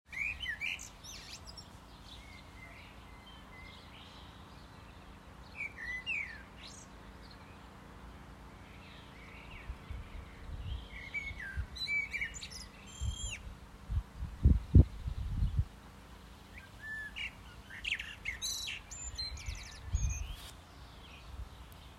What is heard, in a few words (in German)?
Amsel